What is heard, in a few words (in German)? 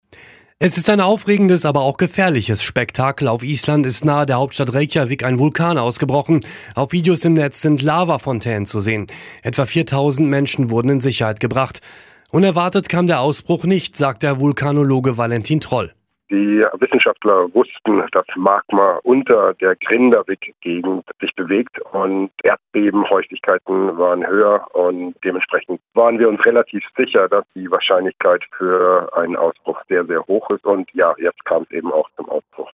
Vulkanausbruch Island